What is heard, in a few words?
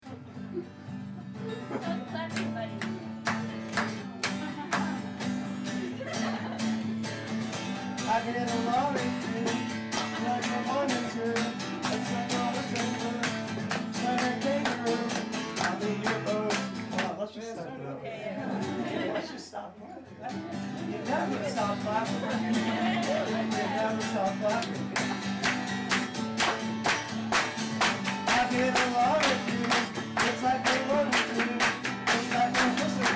Clapping; Clapping Buddy